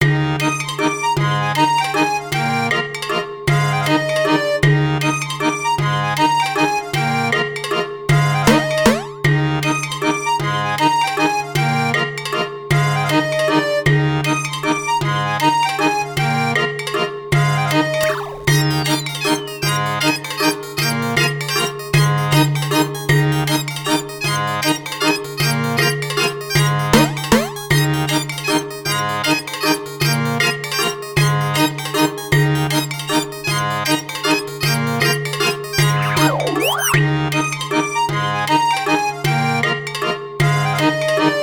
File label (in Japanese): コミカル; Linker Bell Academia Rakudai Majo to Himitsu no Gishiki